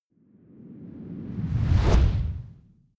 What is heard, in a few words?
fire whoosh synth big flame